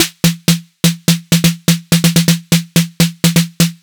perc chitown 125, Percussion Loops, Classic Chicago House